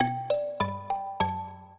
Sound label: minuet, mbira; Mozart